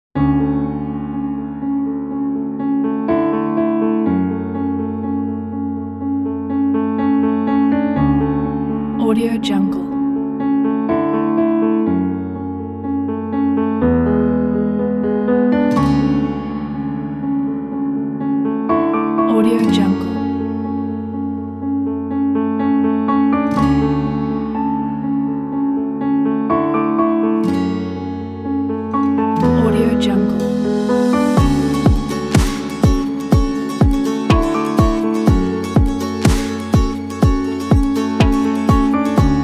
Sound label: land of quiet